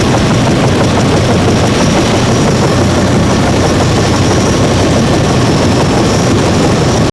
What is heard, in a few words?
helicopter slomo; Max Payne; penthouse